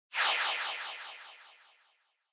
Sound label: Motion Agility Low Delay